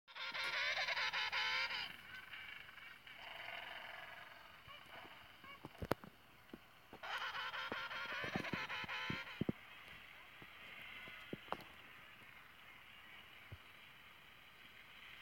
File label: penguin speak